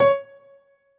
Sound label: count down; lila